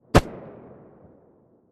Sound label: glock17 silencer mono distant